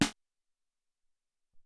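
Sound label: Hop Snare, Alesis SR-18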